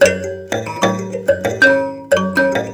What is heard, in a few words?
sanza